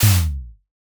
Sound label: soft impact cloth